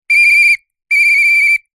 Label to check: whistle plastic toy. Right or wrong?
Right